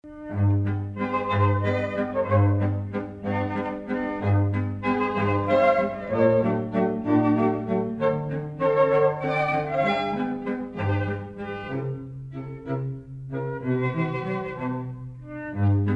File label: Flute, Violin, Cello